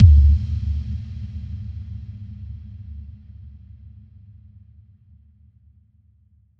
FX Boom

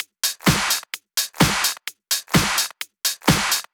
Drumloops 128BPM

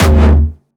D#2, Jumpstyle Kick; Jumpstyle Multis; vengeance essential club sounds-3; VEC3 Bassdrums

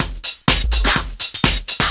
drm, drum loops, low end; house; PS-28 Vocal House Samples

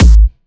Kick, 128BPM; Vengeance Samples; Breaker Kit

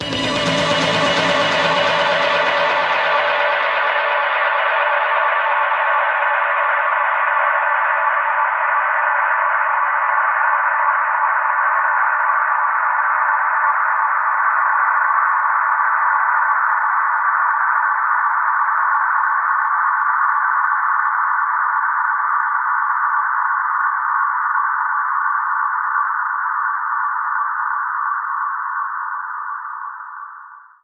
Reverb Athmospheres, FX Vocals; Vengeance Samples

